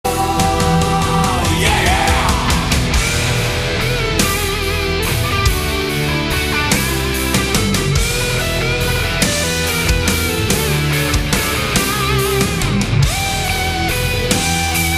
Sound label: Solo